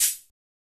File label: Percs